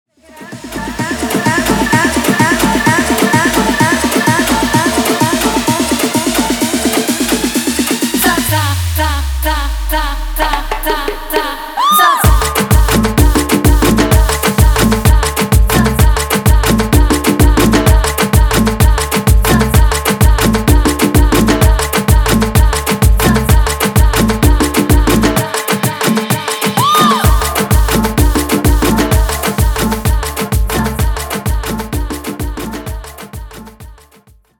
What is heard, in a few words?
afro remix 128 bpm